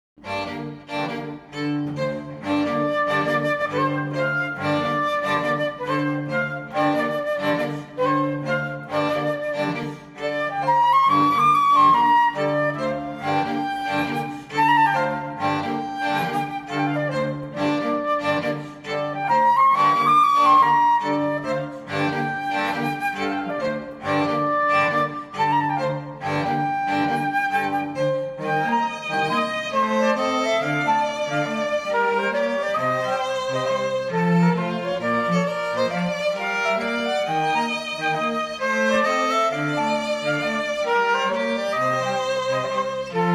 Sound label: Fl Trio; Cantilena South Florida